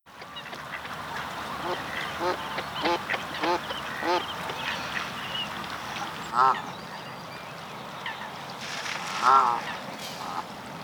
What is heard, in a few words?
Canada Goose, birdsong